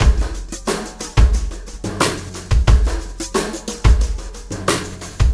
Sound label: Sky Lab Beat, Processed Drumkits